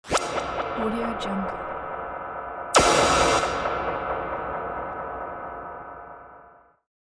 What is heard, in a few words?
accentuated delay whoosh